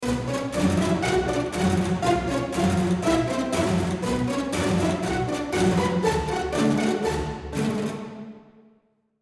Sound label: Hall of the Mountain King, pizz; Majestica Bartok